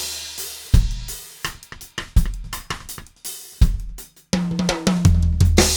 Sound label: 166 Dizzy Reggae